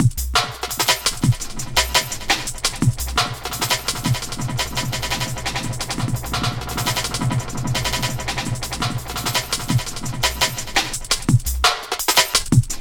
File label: stereo delay; Max